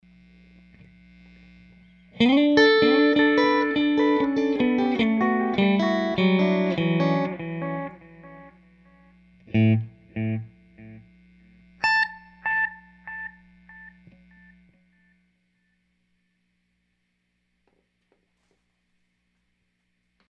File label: pt80 max delay